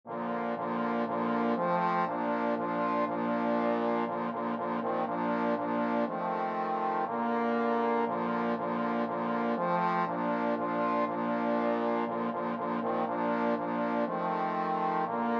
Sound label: trombone quartet